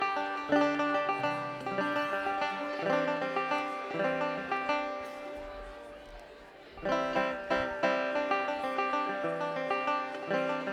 Crowd Banter

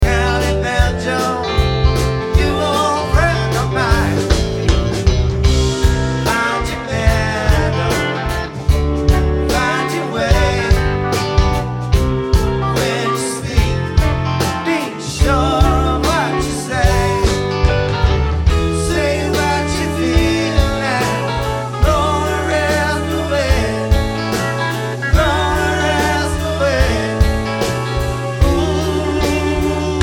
Banjo

